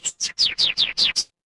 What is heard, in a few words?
animal bird